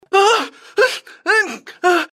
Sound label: scream, male; gmod; npc